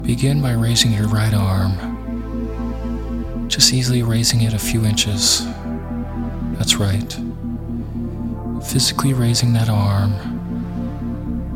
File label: Isochronic Tones; Astral Version 4